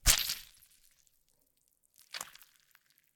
gore - flesh guts gore impact blood squirt wet - hard; Timothy McHugh; Gorification [HD]